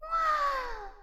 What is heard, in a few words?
wao